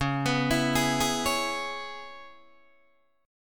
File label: C sharp Minor 7th, Guitar Open D; 11,14,14,14,11,11